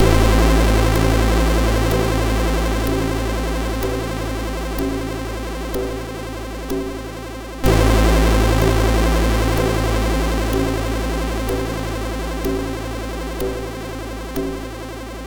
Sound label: Church Bells, MOS6581R2